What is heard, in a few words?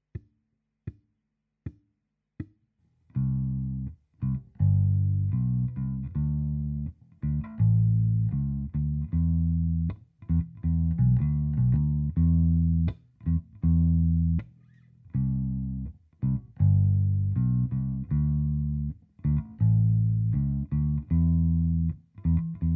bass, guitar